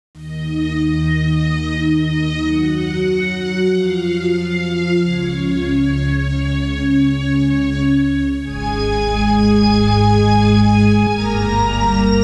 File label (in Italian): musica newage